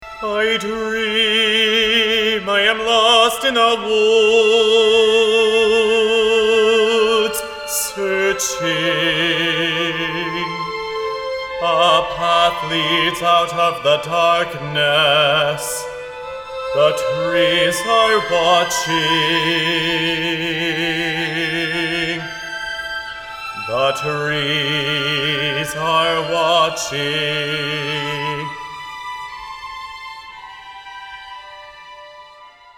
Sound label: singing; opera